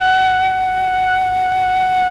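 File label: flute F#3, MELLOTRON; Retro Sampler; Choir + Flute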